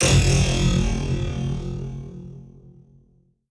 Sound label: magnetron, alien weapon blast sounds